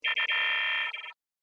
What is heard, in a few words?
alert, computer